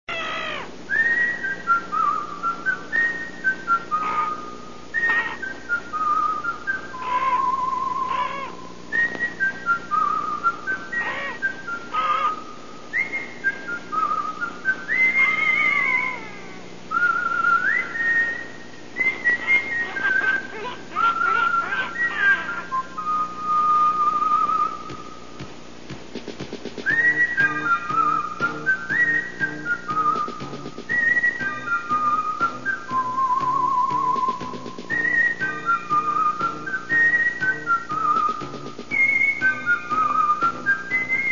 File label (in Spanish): silbido; gohan dbz